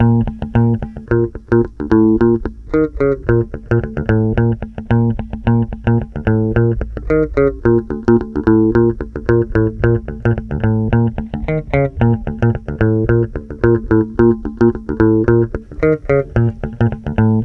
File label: WORLD 110, Bass Grooves; USB Soundscan vol.30; AKAI